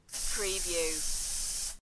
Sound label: Aerosol Spray